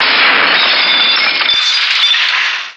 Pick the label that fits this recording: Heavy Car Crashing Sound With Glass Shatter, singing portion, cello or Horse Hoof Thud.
Heavy Car Crashing Sound With Glass Shatter